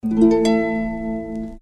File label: guitar presentation, Stabs